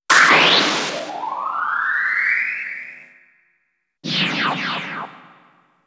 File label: Encount; Radiata Stories; tri-Ace Sound Battle Collection